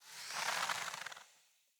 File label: Hunter Bow Ranged Charge Super; Weapons; Player; Bows; EnvoyHunterBowRangedMelee